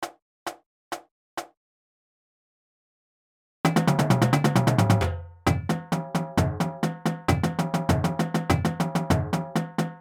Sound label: Paradiddle, Drumline Warmups